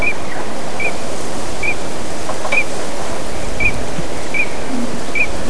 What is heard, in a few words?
tealdrake, birds